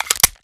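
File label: pistol reload empty mags mag in; gmod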